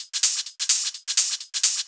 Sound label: Hihat Loop 128 BPM